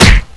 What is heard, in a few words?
punch ko